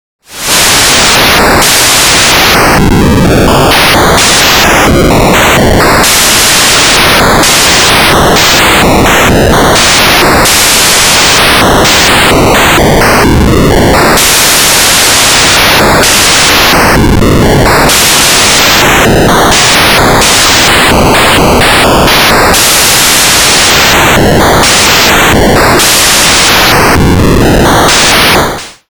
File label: Clock In